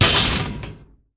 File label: Impacts; Just Impacts; Extension I; soundbits